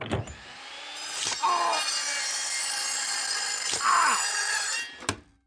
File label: elf ears machine; holiday whodunnit